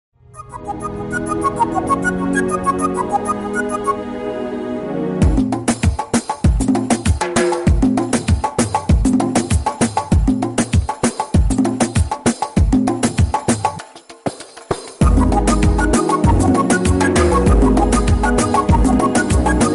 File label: instrumental ringtone